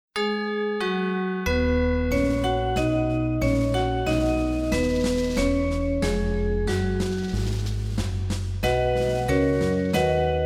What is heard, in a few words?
Music Box Orchestra; Pictures at an Exhibition, Promenade, Modest Mussorgsky; The Baby Einstein Music Box Orchestra; Art Time Classics